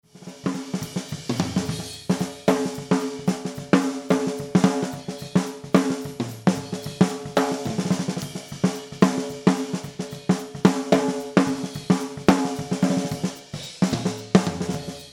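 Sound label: Mix Drums snare drum Comp On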